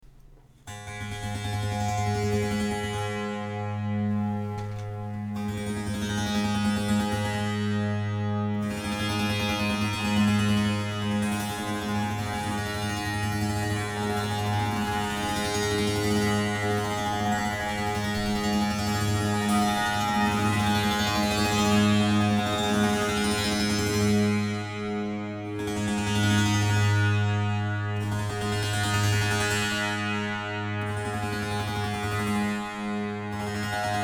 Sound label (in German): klangbeispiel monochord mono